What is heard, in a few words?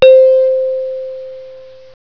Kalimba long; Kids Can Save The Earth; Happy Ending